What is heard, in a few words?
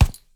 Punching Percussion, Straight Glove (Chain), raw; 99Sounds